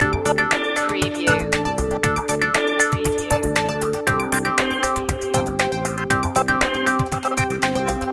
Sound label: informative board loop